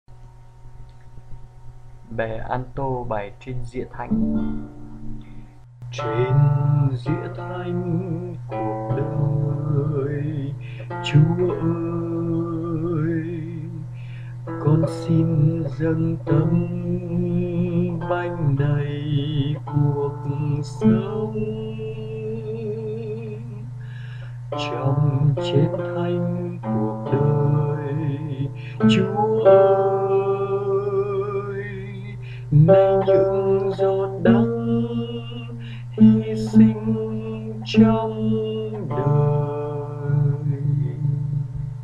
alto

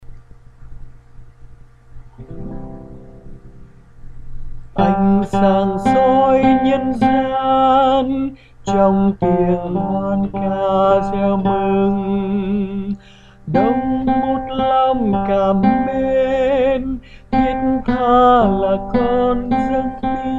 Tenor

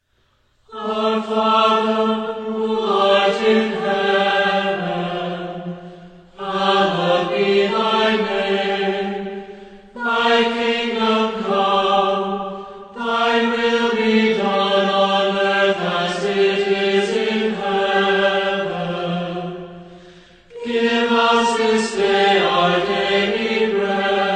Our Father, Choir; John Paul II Choir

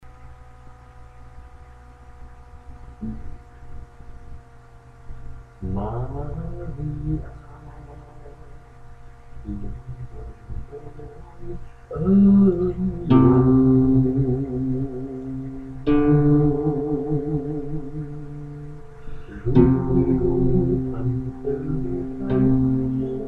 Alto

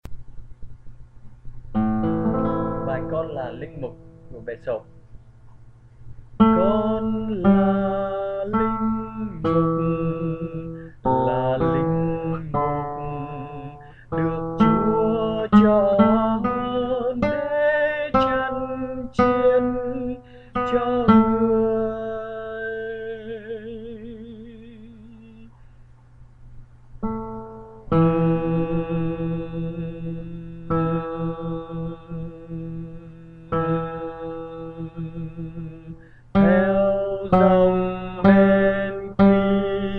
Soprano

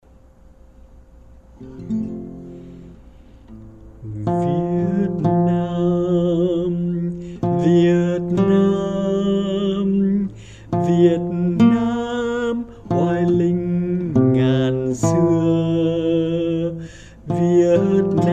Alto

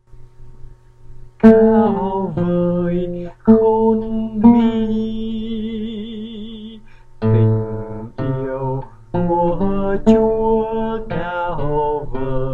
Bass

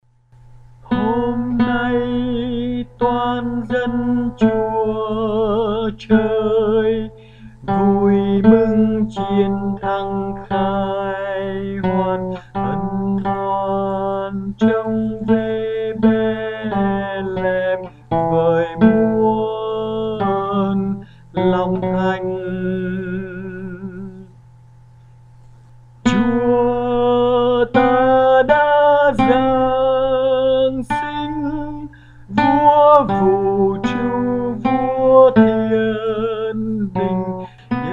Tenor

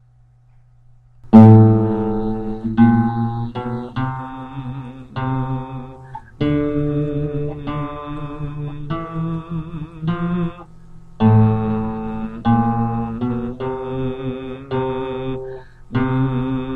Alto Bass

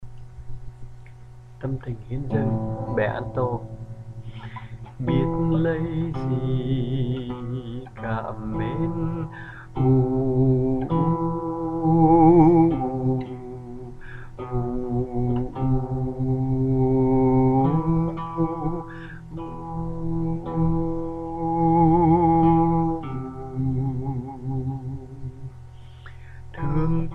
Alto

